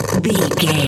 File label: radio tv electronic static